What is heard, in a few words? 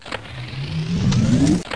Geo Game Sm Scr Enter; Let's Discover Michigan!